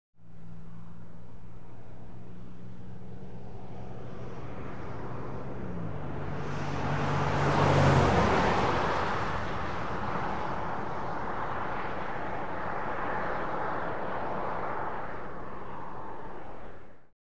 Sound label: Car Exterior - Fast & Dry / By And Away - Uphill; wet traffic